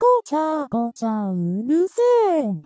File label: Snore; Neru; SM64 CO-OP; Triple Baka Pack